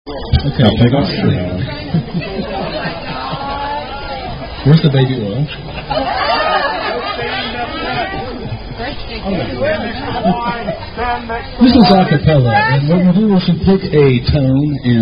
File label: Birthday Dirge; The Dreaded Birthday Dirge; Happy Birthday